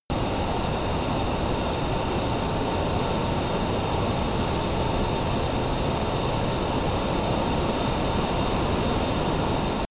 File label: military jet rafale turbine full power direct